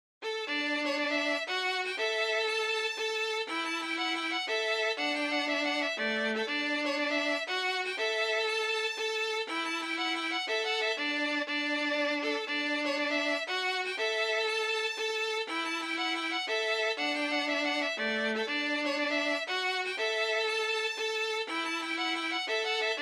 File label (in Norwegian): Gauken melodi 4. stemme